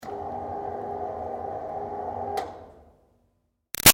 Projector Screen Med Far Up Short; Offices; Big Room Sound

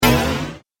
Arcade Game Laser Impact; Video Game Sound Effects; Retro Inspired Sound Effects - Think Nintendo, Atari, C64, Etc.; Andrew Fleming

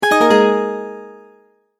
Multimedia Tone-Return/Back; Tones; Sound Design; McKinney Sound

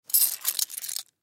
Key Ring Pick Up; Key Ring Pick Up Fast; Pick Up Sound Effects; Objects; Foley

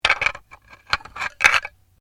Bones Human Move; Material components for constructing more complex sounds; Tapping (Sound Effects:Objects)

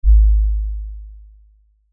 LFE Hit, Lfe Hit Low Bass Impact; Blastwave

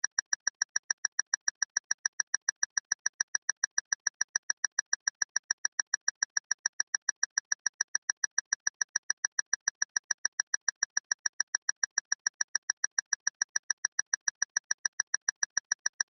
Science Fiction Beeps Timer Counter Device; Tech (Sound Effects:Science Fiction)

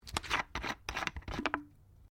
Bottle Plastic Water Cap Plastic Screw Off; Various bottles; Materials

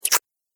Cartoon Slime Splat; Slimy Sound Effects

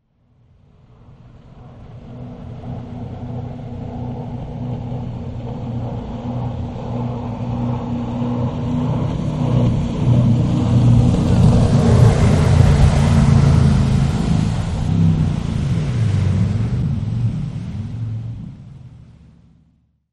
Jet Boat; Boats; Vehicles; Jet Boat-Mix.040

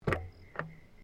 CAR SUBARU LIBERTY EXTERIOR PETROL COVER OPEN; Subaru Liberty 20 01; Family members Subaru; Cars (Sound Effects:Vehicles)

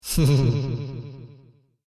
Deep Nasal Laugh With Delay; Laughter Sound Effects

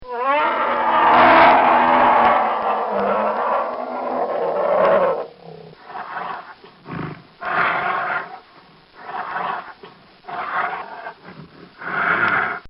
Bear Attaching; Outdoors; Backgrounds; Bobby Cole

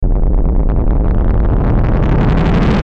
Ascend Dark Low Crackle Hum; Blastwave

